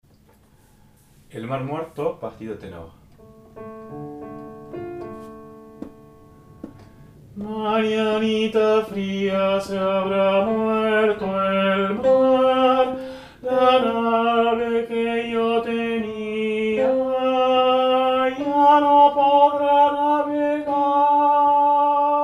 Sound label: Tenor